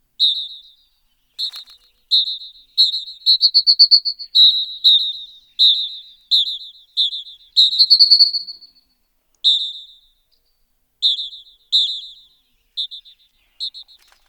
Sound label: Canyon Wren Call